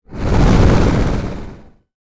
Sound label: Swoosh Transition